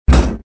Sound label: air door; Soldier Of Fortune II Double Helix; XBOX